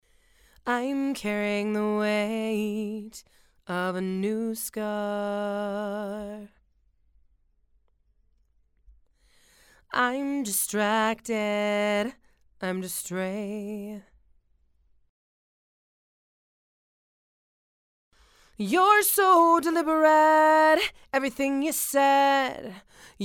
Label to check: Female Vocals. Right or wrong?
Right